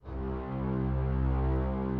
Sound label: Instrument